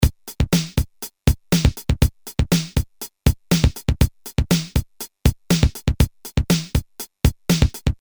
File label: 8 Beat, Boss DR-220A Preset Patterns